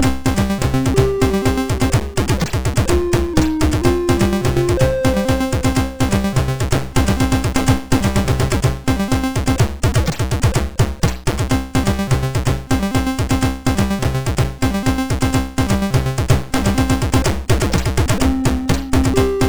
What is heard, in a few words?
sid MOS6581R4; Perplex